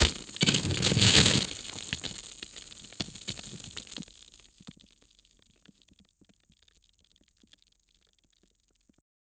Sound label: atmospheric